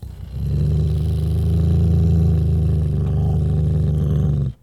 growl; casualtiesunknown

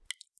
drip; casualtiesunknown

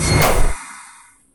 spike stabber; casualtiesunknown

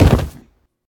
land heavy; casualtiesunknown

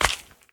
Footstep; casualtiesunknown

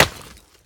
rock; casualtiesunknown

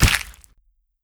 Bone Break; casualtiesunknown

